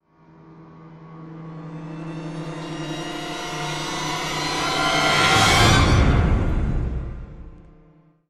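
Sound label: stinger string swell; transformers war for cybertron gamerip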